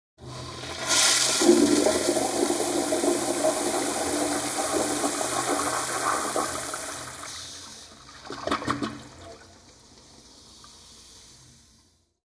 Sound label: Toilet bowl, flushing and filling